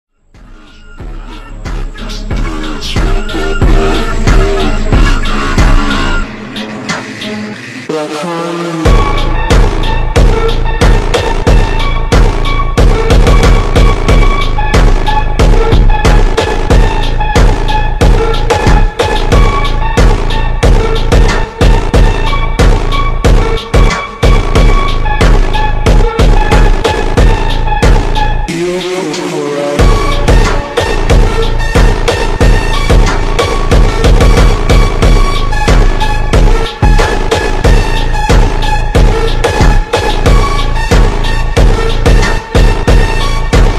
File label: slowed reverb